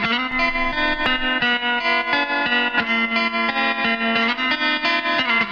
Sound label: guitar honky tonked, loops; Phonky